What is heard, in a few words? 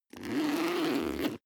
zipper backpack open